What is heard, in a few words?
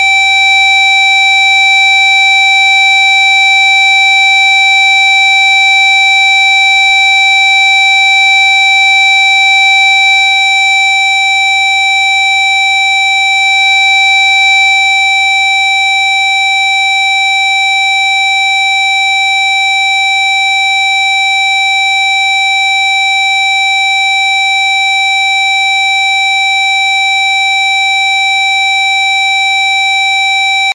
special warning